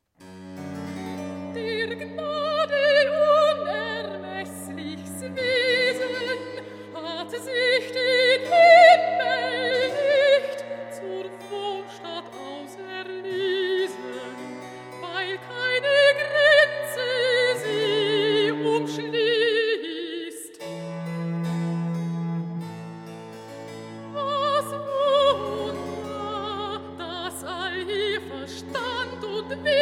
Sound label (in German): recitativo a; christum wir sollen loben schon; bwv 121; der gnade unermesslichs wesen